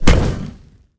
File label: skull torch strike lit; rust